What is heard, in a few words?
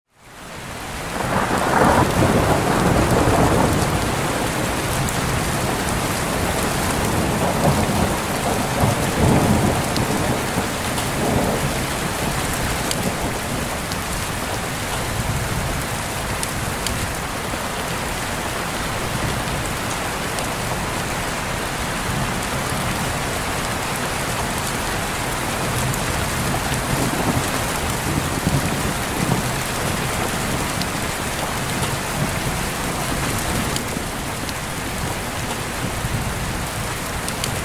Gentle Rain Sounds; Let Yourself Drift Off With Gentle Rain Sounds